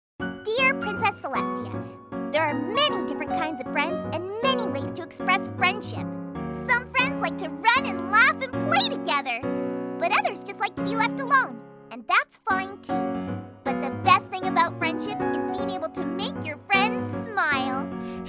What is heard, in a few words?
Piano; My Little Pony Friendship is Magic; Season 2